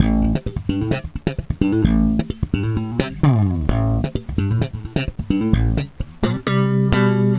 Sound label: Slap, Bass; Sonic Foundry (Sony Creative Software); Crimson Blue and Fabulous Horncraft 4 RnB; Loop Samples